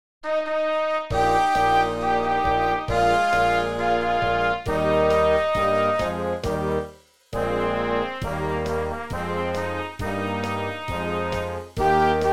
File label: This Little Light of Mine playback